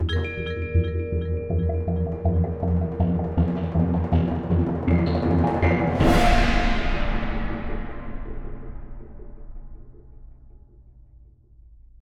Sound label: Music Stings stinger; Kragle